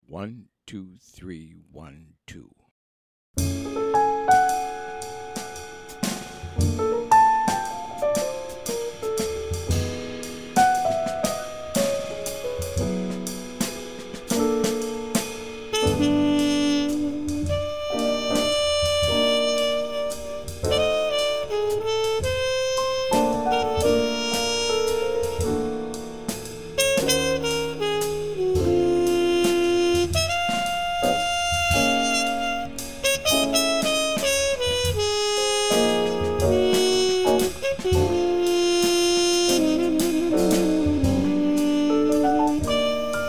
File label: minus BASS